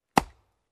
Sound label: Single Wood Chop